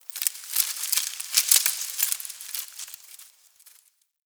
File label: Foliage Rustle, Ambience; Warframe; The New War; Zariman